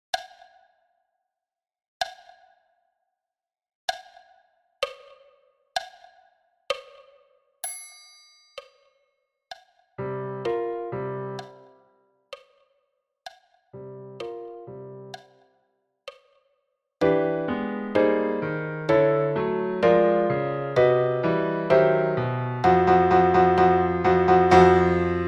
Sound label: PRELUDE ET ALLEGRO 64 bpm